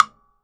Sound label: WOOD BLOCK